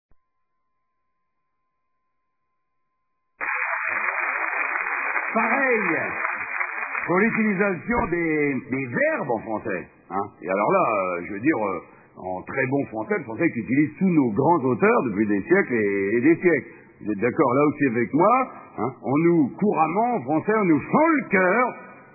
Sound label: 8bit